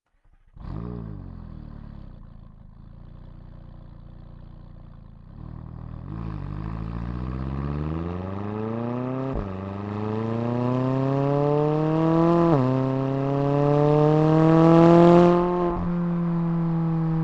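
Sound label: McLaren 570S onboard fast various exhaust right; dpa4062; Sonniss GDC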